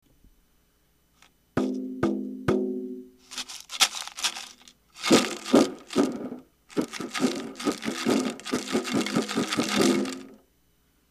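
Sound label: maracas; リサイクル楽器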